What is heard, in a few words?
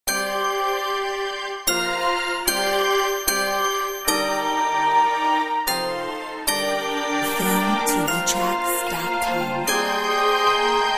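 carol of the bells orchestra, 140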